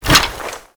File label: Bunraku Puppet Movement; Warframe; Koumei